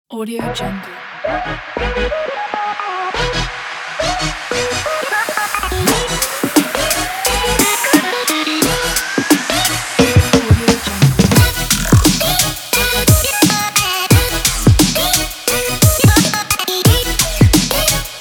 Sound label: happy hiphop